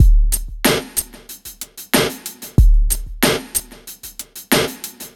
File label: ELECTRO HIP-HOP